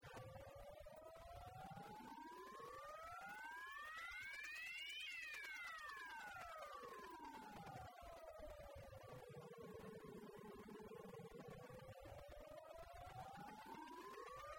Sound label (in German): Ringmodulator